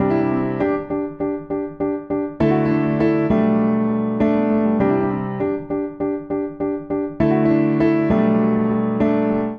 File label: Phrase Downtown Stroll IV-V-I9 G Major 100 bpm, Upright Piano; Upright Piano by Spitfire Audio; Ableton; Factory Packs